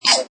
Tui kiss, Exotic bird - New Zealand bird Tui, vocalizing.; Birds; Animals